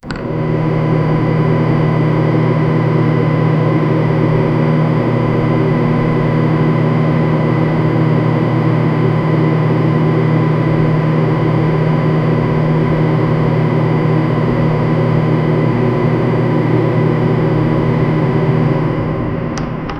ceiling fan sound

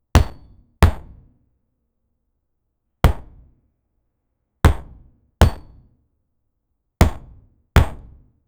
blast effects

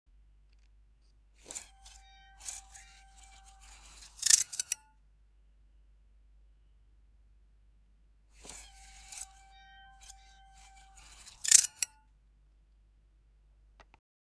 magical attire change

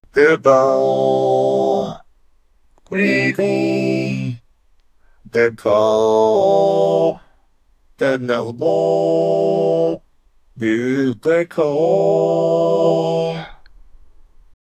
boy song op op op

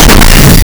a single thwack sound, baseball

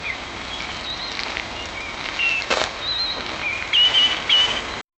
the muffled crunch of boo, savanna

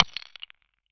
stone chunky; minecraft stone chunky

